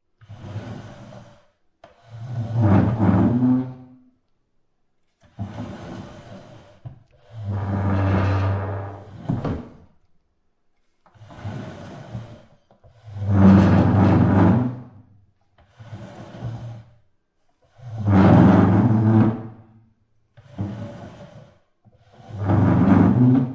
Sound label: Chair Wood Drag On Wood Floor Many